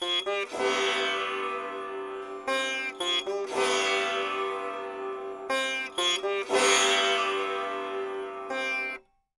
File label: sitar